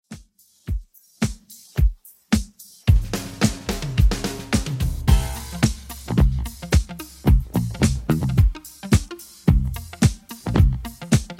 70s Redrum 109bpm

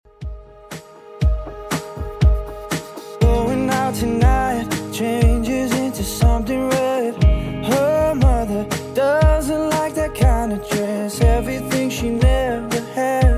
2ks Redrum 120bpm

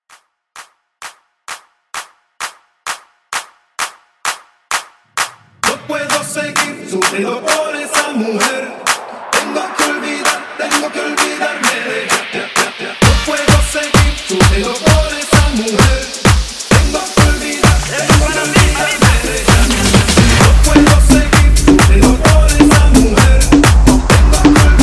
Latin Party 130

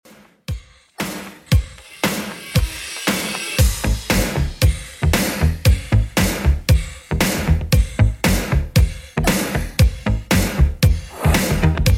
80s Redrum 116bpm

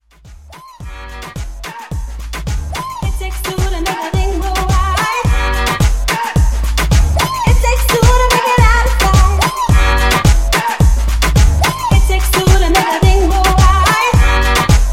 80s Moombahton Redrum; Remixfreakz

